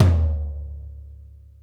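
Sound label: floor, drum kits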